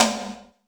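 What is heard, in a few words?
GATE snare; Crypton - DrumBase5000; GATE KITS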